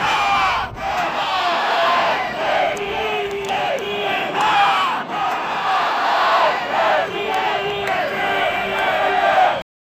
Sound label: group of protestors screa, protest